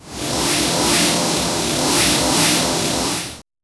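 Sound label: cat running sound effect, pixel art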